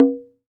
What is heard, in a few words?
Bongo, World Percussion; Cymatics; PHARAOH Beta Pack; Pharaoh Premium Drum Samples (BETA)